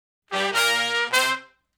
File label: Shuffle Blues Riff (C) low unison, Horncraft for R&B Sections